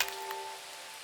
Small Clack, drums; BB 2HTC Samples Vol 4

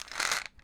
Vitamin B Roll, pill bottles

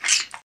slime

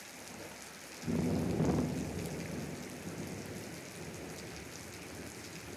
Rain; Weird

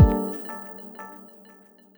Kick Pad; Pads; BB 2HTC Samples Vol 4 Addendum

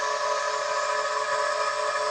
pump; cc0 sfx loops

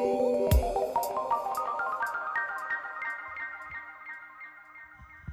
Rising Arp; Weird

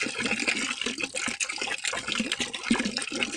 loop water; water splash slime

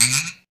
spring

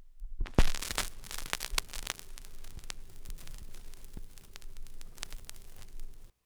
Big Crackle, Record Fuzzies; Micro Pack

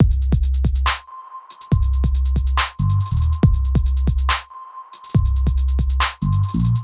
Drum and Bass

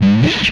Hydraulic

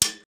metal hit; wood metal SFX

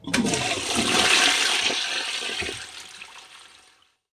toilet

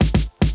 Snare Fill, Drums; BB 2HTC Samples Vol 4

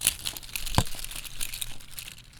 Plastic Rattle; BB Retail Therapy Sample Pack

